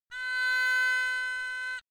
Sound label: haegeum; gugak